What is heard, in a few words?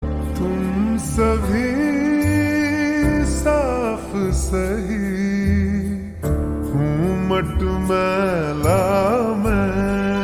Ringtone Slowed Reverb